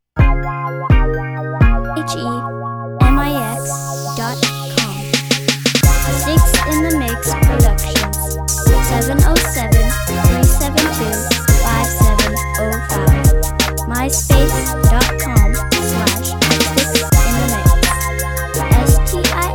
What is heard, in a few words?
love rap, beats